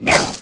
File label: Pirahna Bite; Aliens